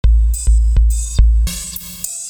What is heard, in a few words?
sizzlin smokin, drumloops; esoteric; ndallthat